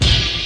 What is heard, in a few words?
crash crunch punch; Punches Hits Discoblasts; single hits